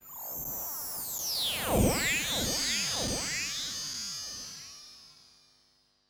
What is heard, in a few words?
Woosh